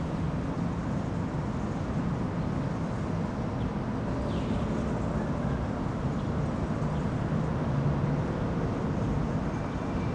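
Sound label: pagoda cityscape; ambience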